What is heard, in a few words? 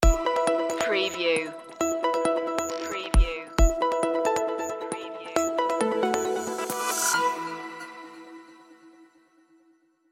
digital environment stinger